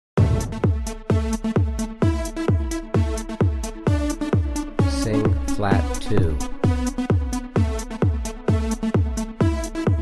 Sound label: Tenor Key of A; Dance and Techno Music Ear Training; Practice Perfect